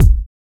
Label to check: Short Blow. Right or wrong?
Wrong